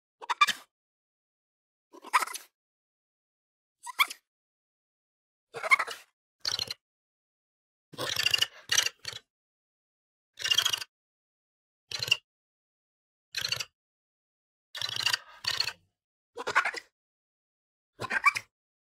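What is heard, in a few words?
medoed, honey badger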